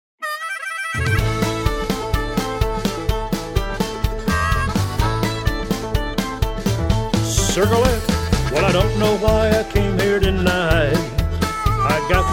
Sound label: vocal